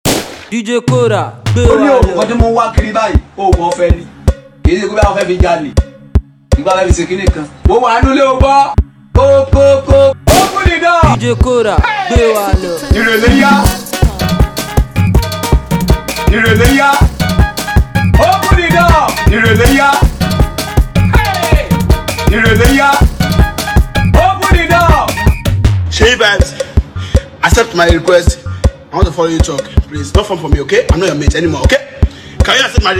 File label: Beat